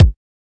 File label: kick